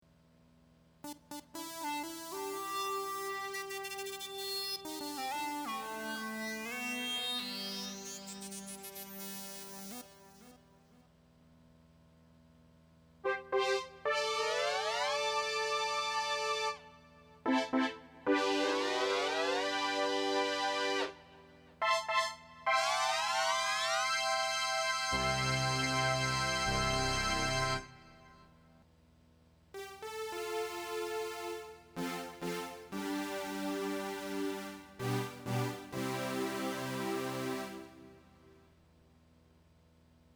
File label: Synth